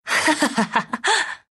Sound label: laugh; Lina; ru; Dota 2